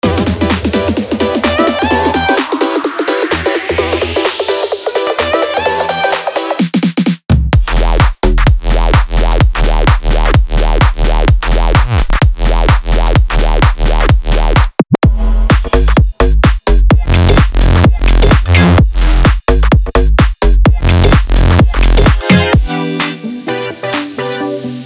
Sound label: Bass Funk Essentials